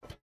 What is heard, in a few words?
footstep hide metal jump start; Rust